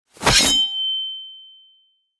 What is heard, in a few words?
axe draw